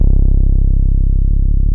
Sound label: BASS -L, Drum & Bass, 180 BPM; Ueberschall; Drum & Bass Resonance